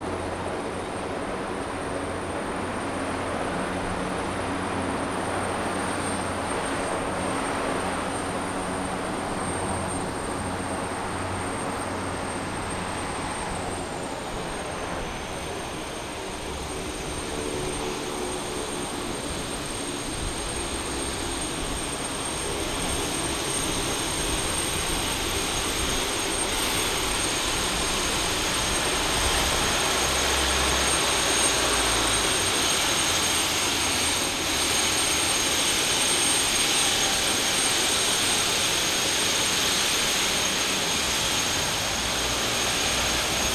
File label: Helicopter Landing; vehicles